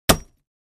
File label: Axe Paneling 1 Side, axe hits